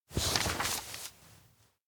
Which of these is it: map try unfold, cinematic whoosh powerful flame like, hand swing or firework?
map try unfold